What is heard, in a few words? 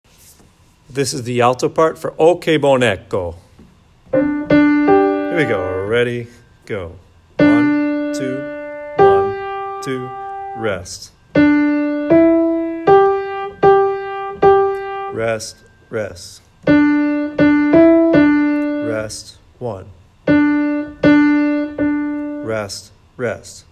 alto, choir; eccho